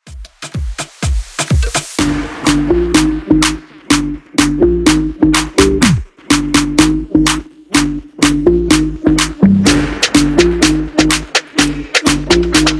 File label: Afro Dance Clean Uptempo 125 BPM